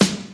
smooth snare drum sample g key

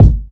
smooth kick single hit g sharp key

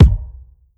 tight low end steel kick drum c sharp key

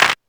clap single shot f sharp key

